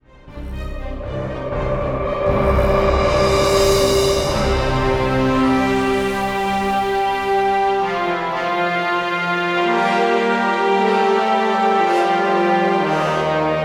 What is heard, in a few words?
Plane Takeoff Stinger; Mob of The Dead; Call of Duty Zombies; Treyarch 2008